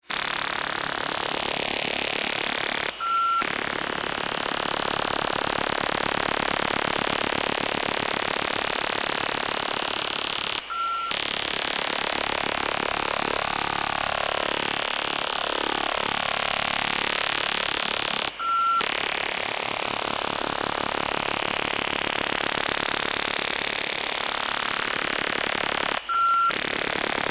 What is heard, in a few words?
17306 kHz USB